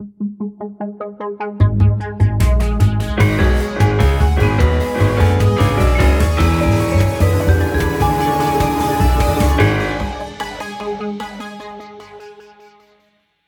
Intruder Confirmed; gun point

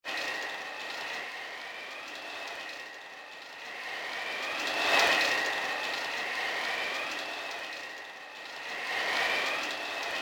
Wind; little red riding hood s zombie bbq nintendo ds

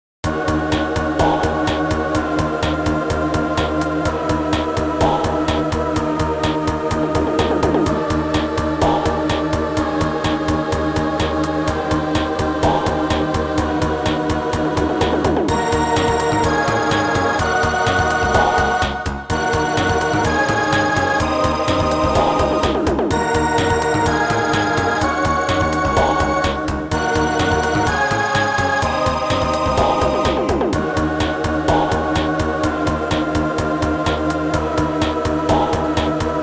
Battle Theme; Battle Theme 1 (Shriekbat Shootout, Triclops Terror, Beetle Blast); Metroid Prime Pinball

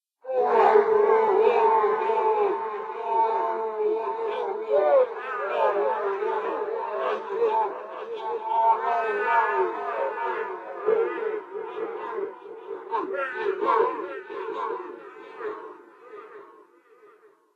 Ambient Moans Male; Silent Hill Homecoming

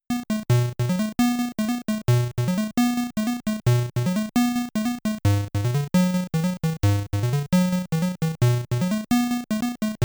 In Game Music

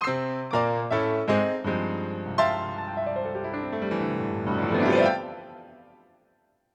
Piano; lego the incredibles 2018 switch

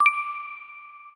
Blink

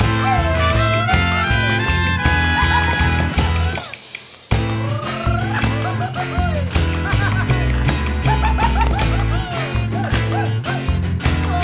Infernal Suspense; Gourmand Land

